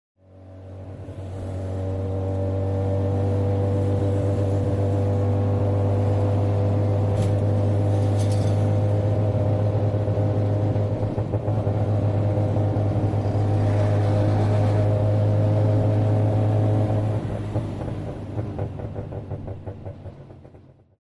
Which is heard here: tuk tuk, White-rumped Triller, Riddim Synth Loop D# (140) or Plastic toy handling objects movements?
tuk tuk